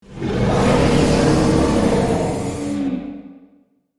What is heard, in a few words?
Dragon aggressive roar attack